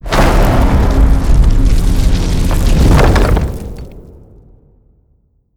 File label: bonus Get Bomb; netent